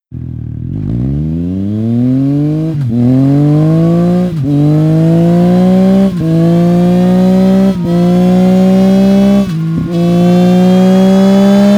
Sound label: triumph tiger race gear change